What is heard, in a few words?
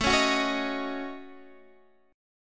A 6th Flat 5th, Guitar Open G, chord